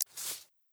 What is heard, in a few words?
map event select click